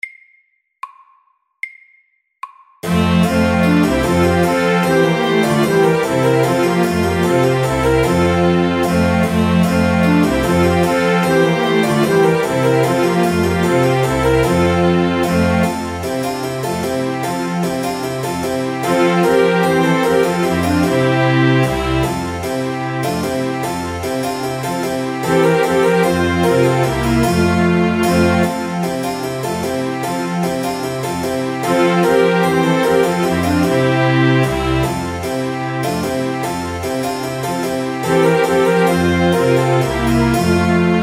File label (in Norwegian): Trumpet tune FORT